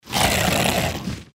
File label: boss snarl